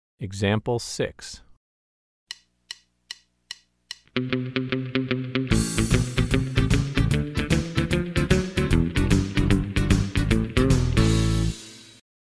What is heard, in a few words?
Country Rock